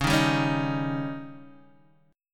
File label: C sharp Suspended 2nd Flat 5th C sharp Guitar Open D, chord